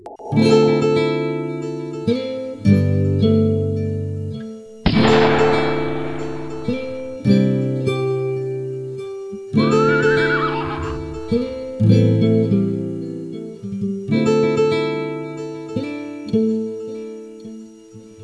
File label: Cowboy Guitar